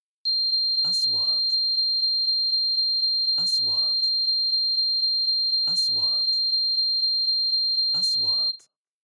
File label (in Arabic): صفارة إنذار; transitions